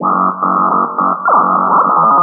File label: loop